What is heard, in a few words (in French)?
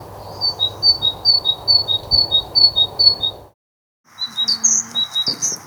mesange charbonnière